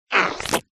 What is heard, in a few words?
cartoon squeeze pull squeak rubbery squelch